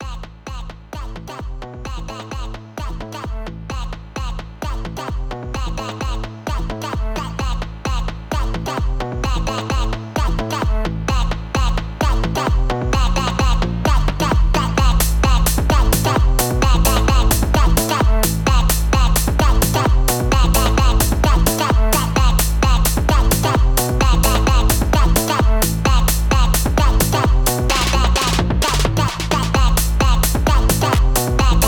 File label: Energetic, EDM Pop